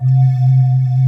C1 MULTI, CRYSTAL SYN; AKAI S6000 CD-ROM - Volume 1; SYNTHESIZER

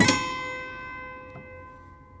Prepared Piano; Battery 3 Sample Kits

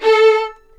A4 VLNS ARCO DB; East West; The Ultimate String Collection

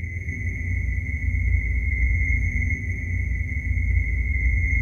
space, atmos; AMG - Vince Clarke Lucky Bastard

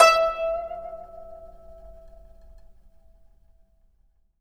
GUCHIN E4; INIS - Opium

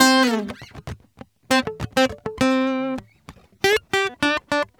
GUITAR SAMBA

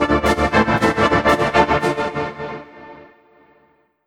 ORCHFX, orchestra, Asian Traditions

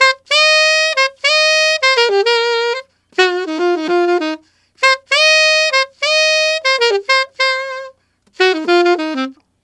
Alto RnB C min, Sax; Sonic Foundry (Sony Creative Software)

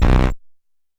Tom Lo, Stylophone Beatbox

